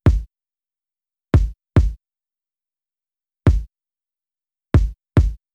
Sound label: kick original